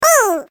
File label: slide; Carrot cookie